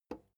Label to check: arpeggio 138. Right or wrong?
Wrong